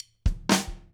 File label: count fill, drum; Steve Gadd Drumscores